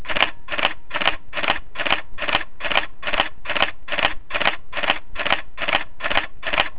jpeg, Sony Alpha DSLR-A330

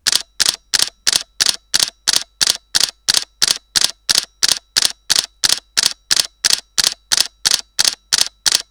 continous L RAW, Canon EOS-50D

